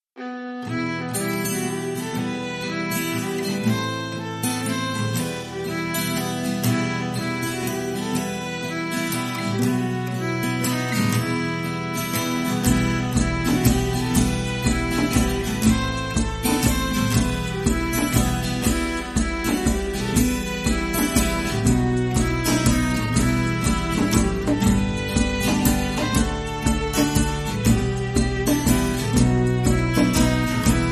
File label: music